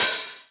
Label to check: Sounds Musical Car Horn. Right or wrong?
Wrong